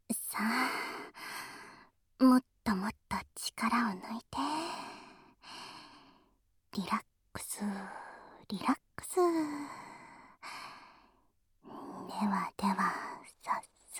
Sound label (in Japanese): ママとぺろぺろ♪【耳舐め】; ASMR; えろまま